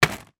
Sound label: sweets bag drop down on table; skittles